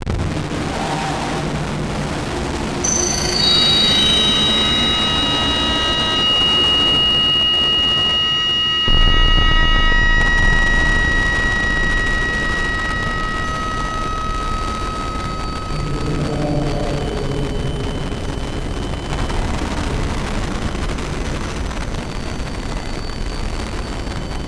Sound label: Distortion Static Beeps; Module Production Element Title Imaging